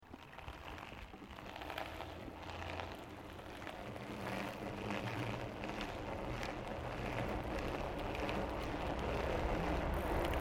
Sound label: Bicycle Mountain Bike Road Start Freewheel; Bike near Tazawako Japan; Bicycles (Sound Effects:Vehicles)